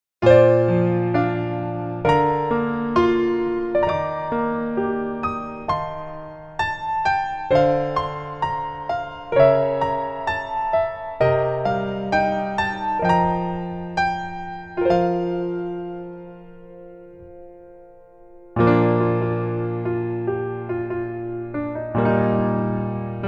Piano ver 멜로디MR

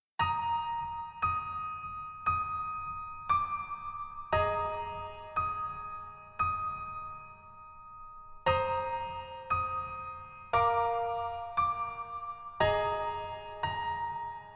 Piano ver 멜로디MR -1키

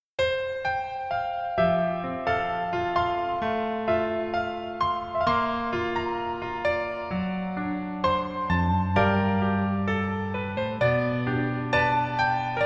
Piano ver 멜로디 MR 여자 키

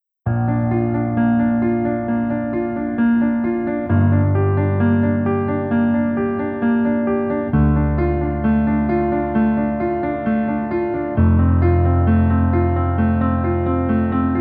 Piano ver 멜로디MR 여자키

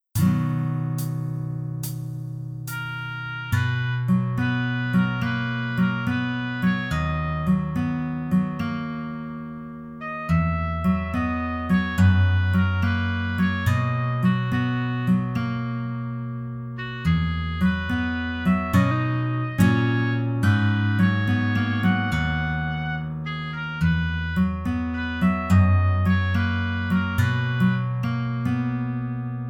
Piano ver 멜로디MR 남자키

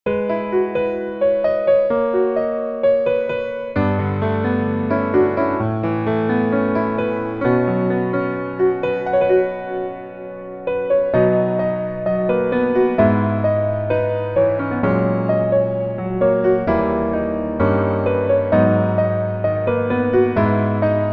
Piano ver, 멜로디MR, -1키

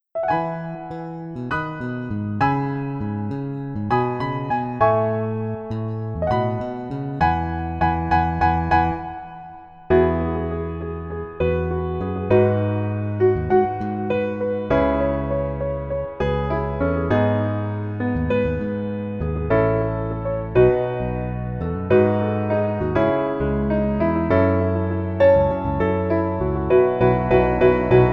Piano ver 멜로디 MR 여자 키

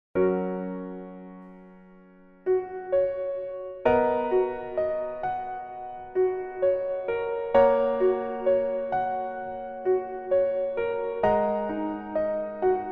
Piano ver 멜로디MR 여자키

